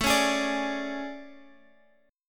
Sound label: A Major 11th, Guitar, Standard; strummed